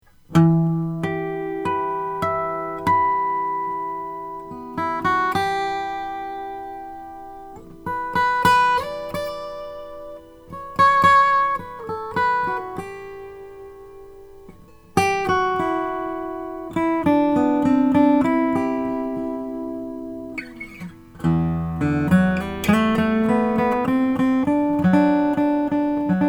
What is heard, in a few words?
indian hill concert kauri